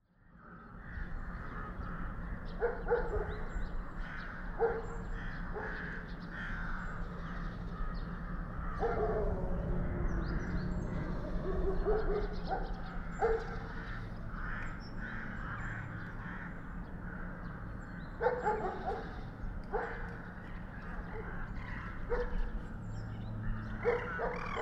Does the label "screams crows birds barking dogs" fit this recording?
Yes